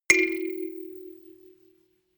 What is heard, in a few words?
notification kalimba rattle second